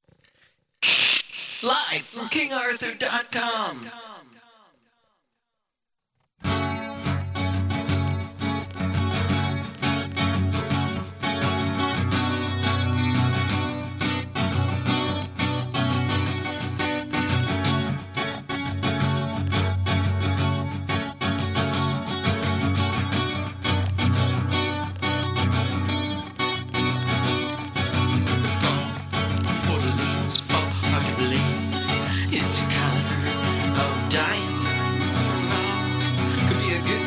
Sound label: Soundcheck